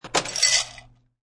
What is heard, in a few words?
Dumping ice into glass.; Drink Mixing; SFXsource